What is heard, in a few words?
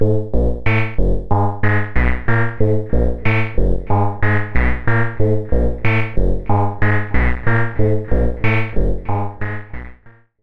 Behringer Space FX B2500 Bass Delay